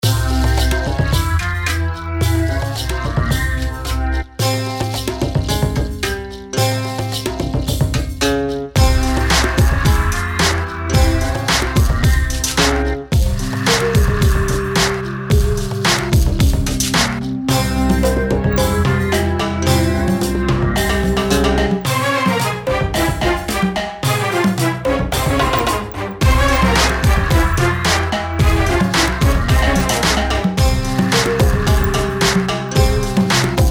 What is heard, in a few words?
oriental